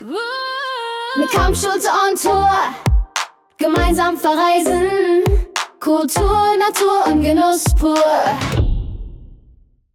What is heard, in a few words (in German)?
djingle kurz; Mit Kampschulte on Tour; oberfranken